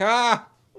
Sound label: "ha", Voice; ooh ahh